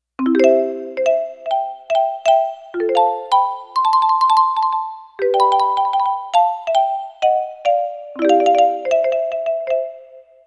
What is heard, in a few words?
Marimba